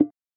symbol Bounce; finn

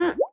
duck Hover; scruffy duck

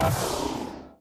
quick Stop; spacewars

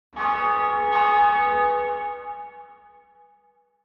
ambience churchbell; dead or alive 2

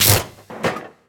shock idle; eggomatic

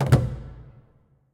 Continue; alohaxmas

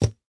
chip on table, blackjack; blackjackclassic

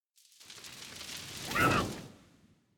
fire Sym Idle Anim; elements

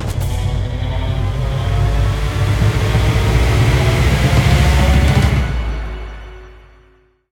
big win near win; aloha

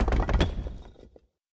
avalanche Block; pyramid

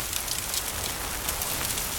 free Spin Reel Spin; secrets of christmas

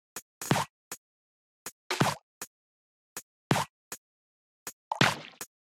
music main game snare; reef raider

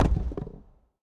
block block; pyramid

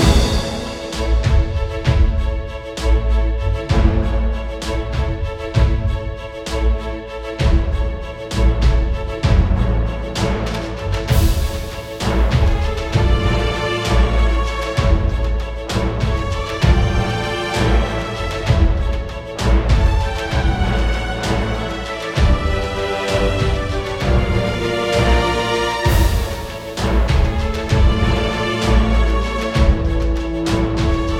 Freespin Theme; oceans treasure

